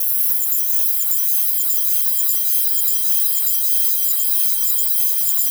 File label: ELEC SHOCK; Distored Reality